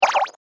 Laser Revolver, laser gun, science fiction sound effect